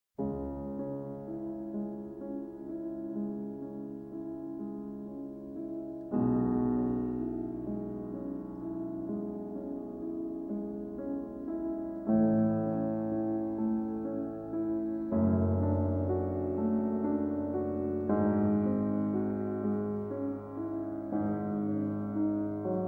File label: Piano Sonata No. 14 in C-Sharp Minor, Adagio Sostenuto